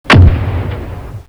blow; cstrike; sopka

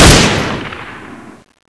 lockon gun; cstrike

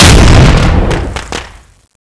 firecracker explode; cstrike

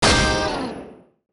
guitar Shot, weapons; cstrike

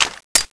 StG44 clip out, weapons; cstrike

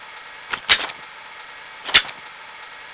reload; blocksmg2; weapons

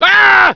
scream, scientist; cstrike

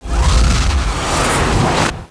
stargate close; cstrike; kz kzfr stargate

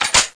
reload after; weapons; bouncer; cstrike

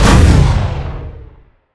skill start; weapons; bufffiveseven blue; cstrike

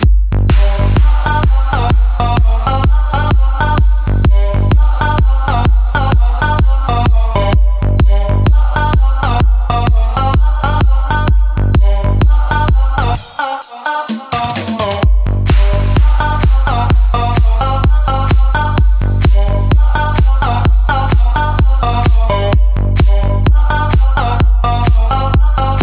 music; jb community

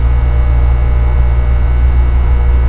electric hum; cstrike

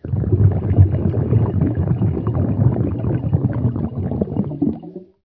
ambience; bikibot

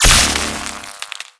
tele zap; cstrike

